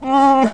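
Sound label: Wookiee male hit; Star Wars Knights Of The Old Republic I; XBOX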